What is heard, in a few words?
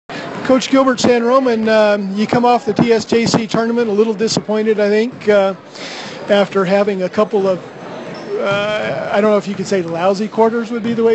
cowbell